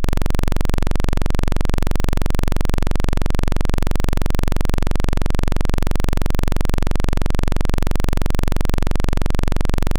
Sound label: tone